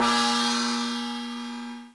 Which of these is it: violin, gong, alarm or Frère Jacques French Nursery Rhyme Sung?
gong